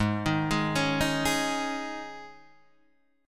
G sharp Suspended 4th G sharp, Guitar Half Step Down